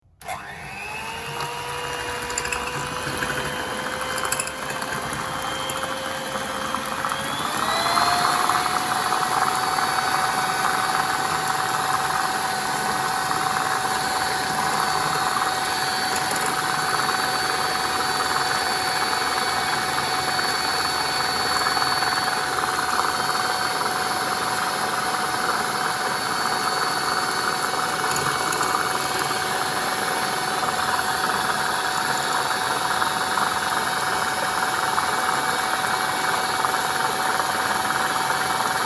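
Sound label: kuhonnyi mikser, kitchen mixer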